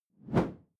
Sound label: whoosh simple short hard; swooshes-swishes